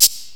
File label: CLICK